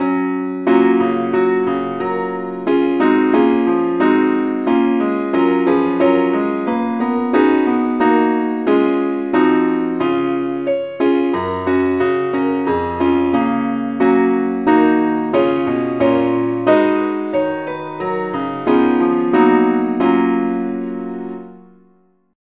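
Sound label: keyboard only, key F sharp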